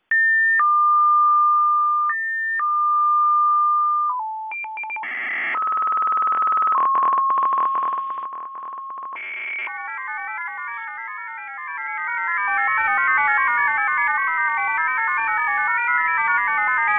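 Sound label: Russian Intel Hybrid MFSK-80, 80-TONE 10 Bd HYBRID MFSK WAVEFORM; (RUSSIAN INTELLIGENCE)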